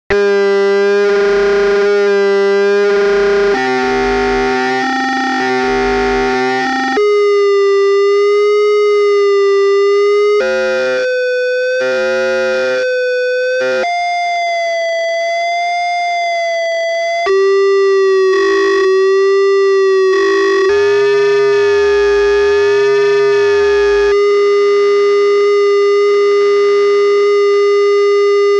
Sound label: Radio; Milk outside a bag of milk outside a bag of milk